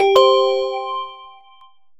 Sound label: Device Connect; Linux